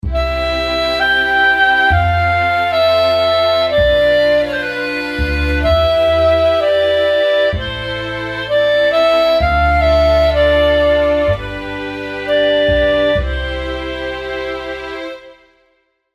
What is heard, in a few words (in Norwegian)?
stryk og klarinett og bass